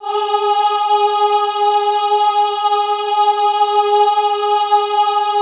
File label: AHH G3, choir; Best Service; Extended Classical Choir; AHH MIX